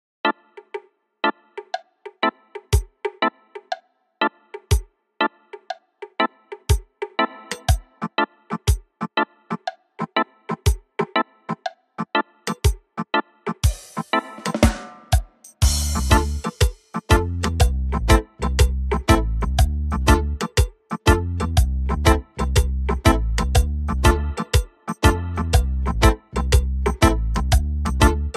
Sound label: reggae; deathmatch; n3xt inventario